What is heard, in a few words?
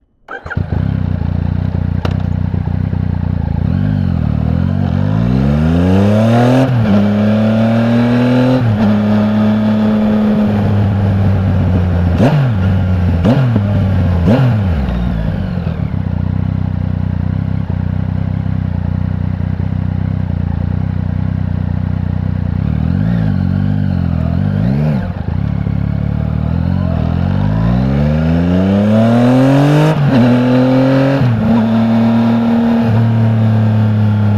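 Yamaha MT09 Onboard Start Medium Drive Stop Off Engine Mix; 2015; Pole Position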